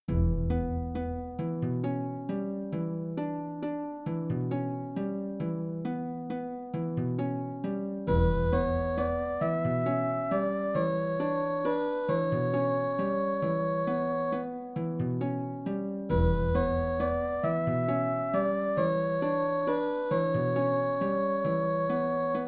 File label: Orgel; kuro no juusan gamerip